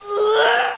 dying

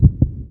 hydra stand

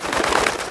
filir attack; classicro

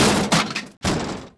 mimic die; classicro

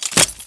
freezer move

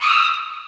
damage; classicro

